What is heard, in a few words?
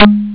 Xylophone